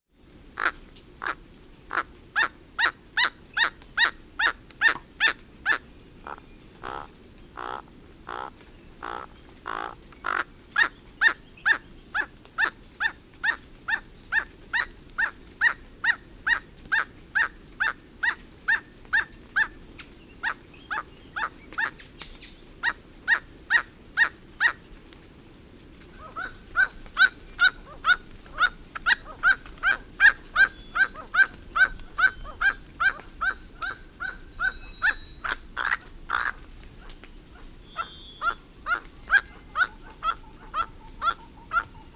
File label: Song and calls from Several, Birds of the Costa Rican Cloud Forest